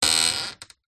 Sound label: household antique larder door creak; zapsplat